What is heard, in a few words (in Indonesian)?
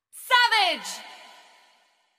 Nada Dering Exotic; Mobile Legend